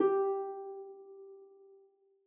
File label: game show quiz bonus reveal